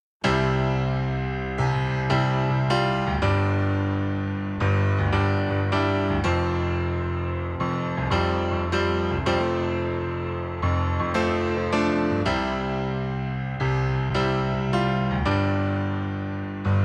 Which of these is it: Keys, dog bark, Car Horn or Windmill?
Keys